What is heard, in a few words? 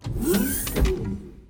Door Open; Environmental